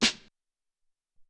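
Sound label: Hop Snare, Alesis SR-18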